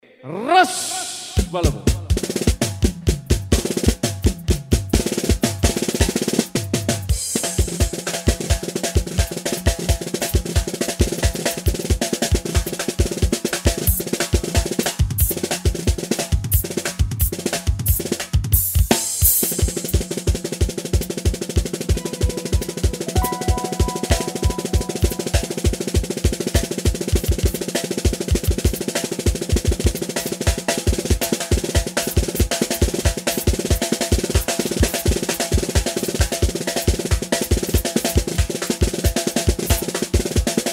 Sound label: Drum Solo; ShaaFM; Christmas Party